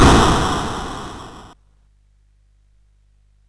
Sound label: sid CSG8580R5